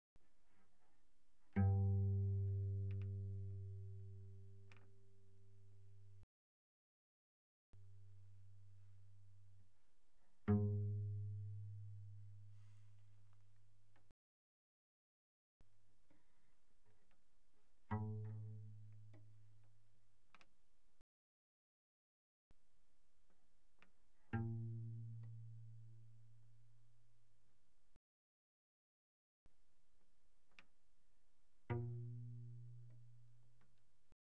Cello pizz pp sul G G2 Bb2; Strings

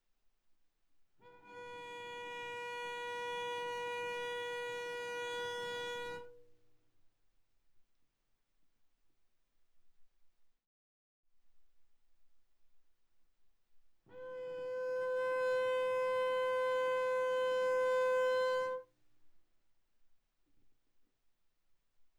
Viola arco sul D ff C6 D6 stereo

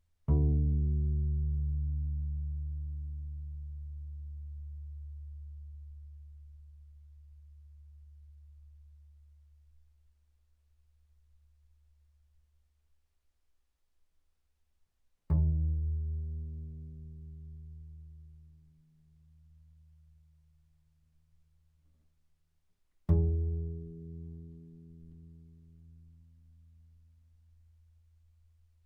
Bass pizz sul D ff D2 B2, double bass

